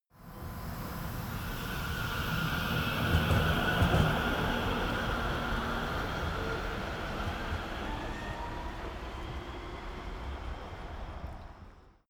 train subway new depart; bahnverkehr u bahn

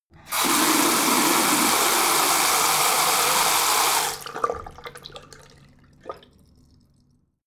tap flow ultra hard short, badezimmer

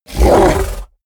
fishman vocal snarl short; kreaturen

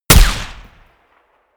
gun shot silencer designed ricochet

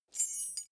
key ring mid size fly tinkle; objekte; schluessel

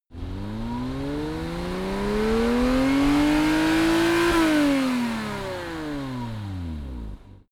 sports car porsche boxster rev single exterior; verkehr transport; autos

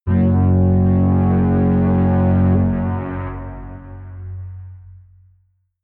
synth tremble bass, drones

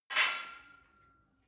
barbell weight rattle, fitnessstudio

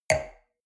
wine glass place table soft short; soundpool

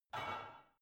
barbell only replace reverberant soft

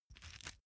small pepper grinder soft

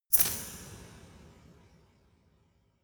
resonator hit double hard

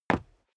step sneaker concrete single stair up; schritte

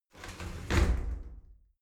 shower slide doors close regular; objekte; tueren

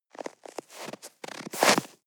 step snow scuff

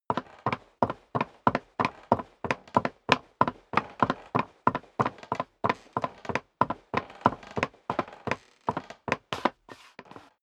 step sneaker wood creak run sequence; schritte

